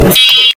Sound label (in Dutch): fatbike toeter